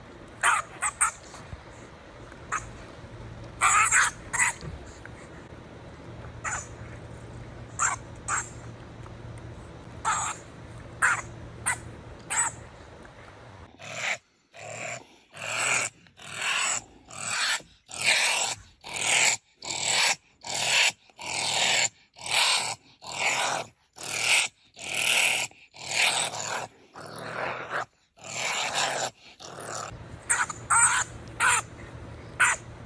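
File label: medoed, honey badger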